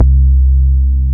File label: BAS MINI, MOOG; Sound & Vision - Gigapack II CD 1